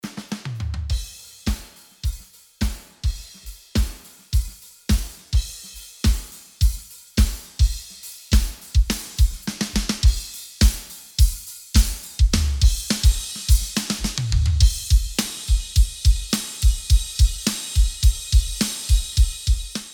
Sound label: Drums; warmaudio; pultec shootout